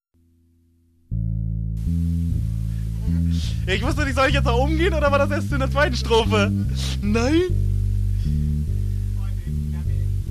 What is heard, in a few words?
drums outro